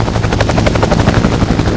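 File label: helicopter engine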